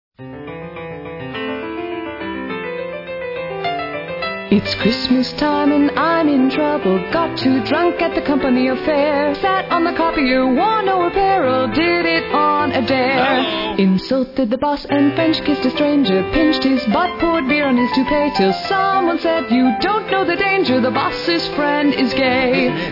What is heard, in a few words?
Christmas Party Song